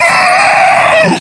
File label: Attack; KF Christmas; Elf